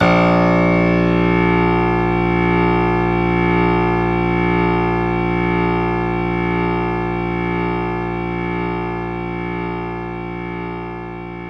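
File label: Grand Piano A sharp 3; knights try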